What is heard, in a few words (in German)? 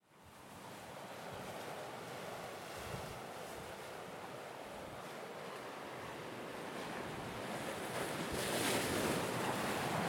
warnemunde wellen am leuchtturm